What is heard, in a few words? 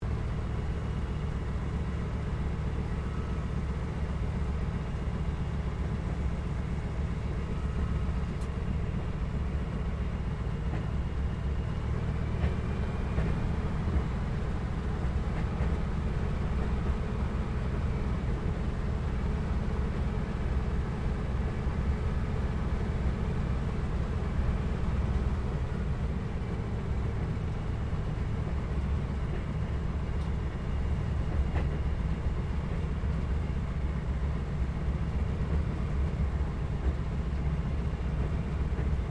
AIRCRAFT CA-13 BOOMERANG TAXI INTERIOR; Airplane; Vehicles; Airplane Sound Effects